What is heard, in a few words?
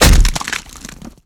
impact; gonarch